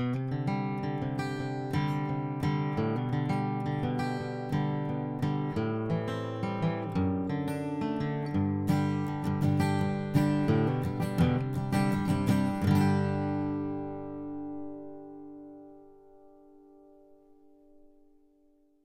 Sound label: TC20 on Acoustic Guitar; earthworks